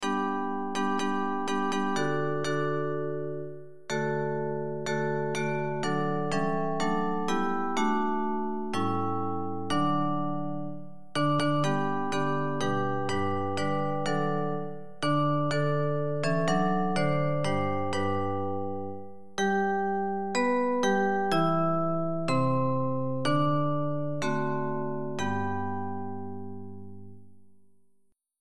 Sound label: Bells